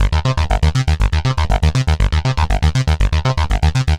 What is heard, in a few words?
Arpeggio Bass, Prototechno; Sonic Foundry (Sony Creative Software); Basses